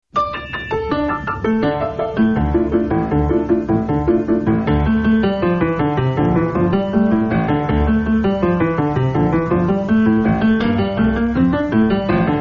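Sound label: God Rest Ye Merry Gentlemen piano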